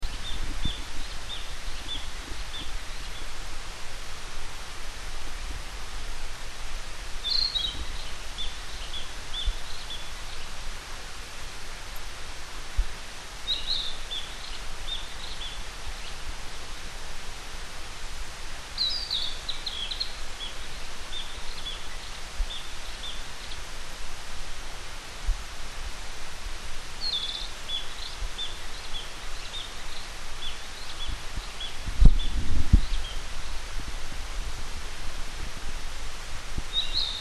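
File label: kibitaki, narcissus flycatcher; キビタキ; ここ数年 山の畑のそばの林から聞こえてきました。; 木の上の方の枝に止まっていて飛んでいる虫などを捕食する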